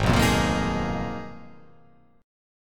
Ab Minor 6th Add 9th, Ab; strummed; JGuitar

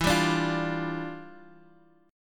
E Minor 7th Flat 9th

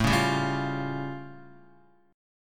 A Augmented 7th A Guitar; strummed; x,0,1,0,1,1; JGuitar

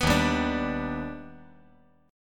Eb Augmented Major 7th Eb down; Eb+M7 strummed; JGuitar

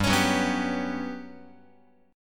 G Major 7th Flat 5th, Guitar; GM7b5; strummed; x,3,4,4,3,6

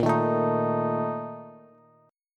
A sharp Major 7th Suspended 4th, Bass; strummed

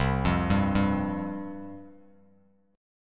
C Suspended 4th, chord, Bass Standard; Csus4 arpeggiated; JGuitar

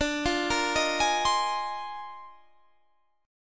A sharp 11th

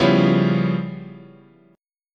Db 7th Sharp 9th Flat 5th, chord

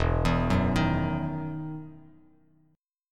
E Major 7th Suspended 2nd E down Bass Standard; arpeggiated; JGuitar

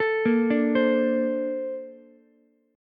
Bb Major 9th, Bb, down, Ukulele, C6; arpeggiated; JGuitar

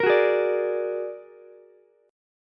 A sharp Augmented 7th A sharp Ukulele; A#+7 strummed; JGuitar

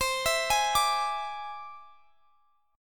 E Augmented 7th C

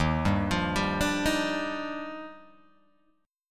D sharp Major 9th, Guitar Open G; arpeggiated

